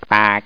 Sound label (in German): quak; dosgames; runaway